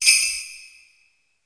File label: change Hero